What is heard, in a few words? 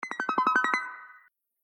doppler shifted ringtones ios